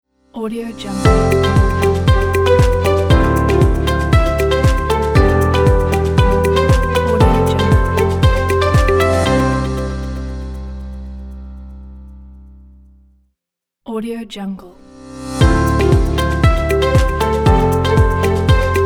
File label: inspiring corporate logo ident